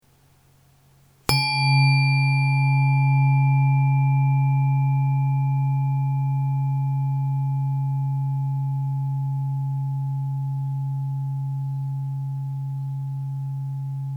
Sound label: Om Tuning fork Aluminium; mid